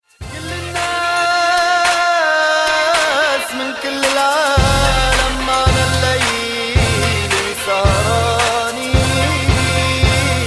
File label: Arabian Remix